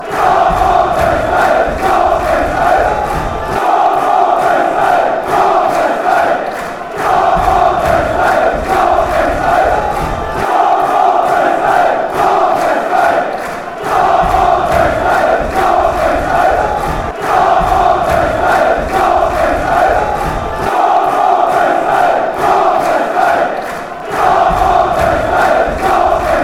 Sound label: come on, chants; psv